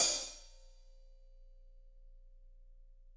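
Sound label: hi-hat, Drums; Bob Clearmountain Drums I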